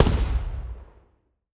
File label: infantry fire standing; Hearts of Iron IV Death or Dishonor